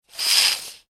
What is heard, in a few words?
industrial tools tape measure metal extend; Metal Measuring Tape Extends; Machinery (Sound Effects:Machines); McKinney Sound